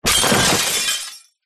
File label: impact crash mostly glass hard